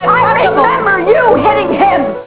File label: hitting; dolores